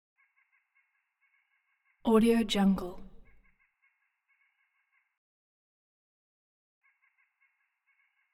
bird song white brested nuthatch

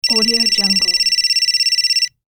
cell phone ring

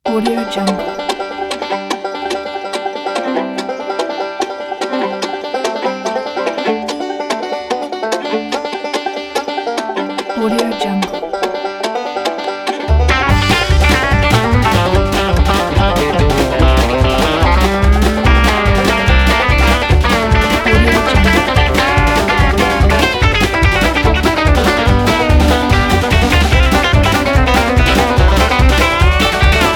upbeat fast country

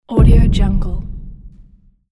cinematic deep hit

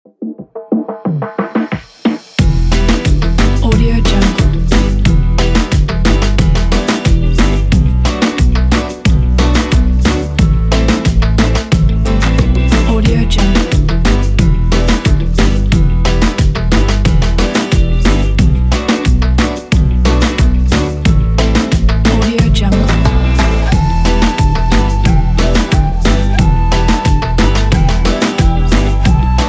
latin reggaeton guitar cumbia

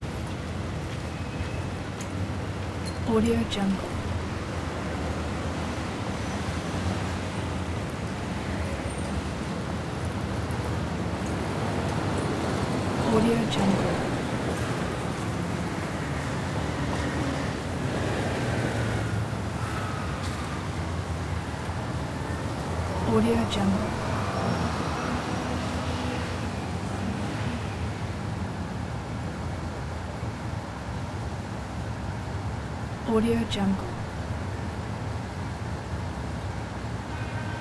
washington dc parking garage ambience

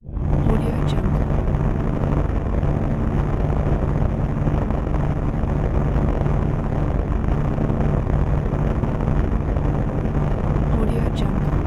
scifi flame; soundcreator

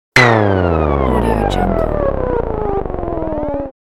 cartoon slowing down fun sound

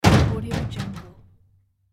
oil drum impact

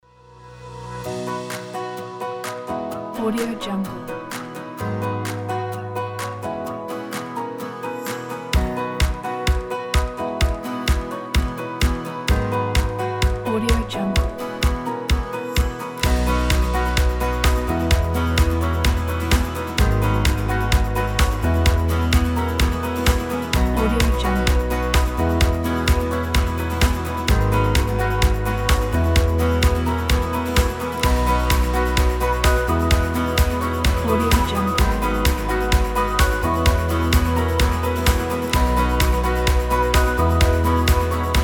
inspiring indie folk acoustic

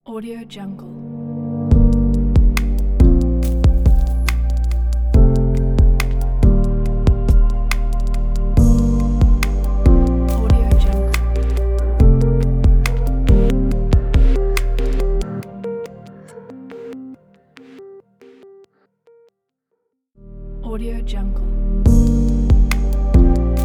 tech minimal logo

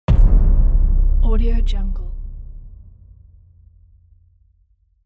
boom

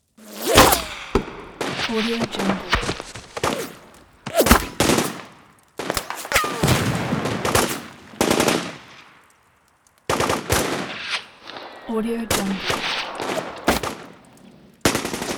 gun battle moderately busy weapon fighting

